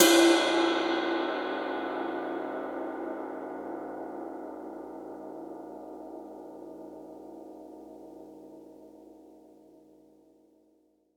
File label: Ride